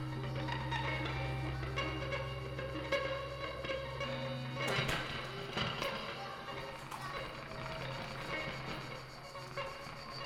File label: gtr crickets; Live; Live in LA OBRA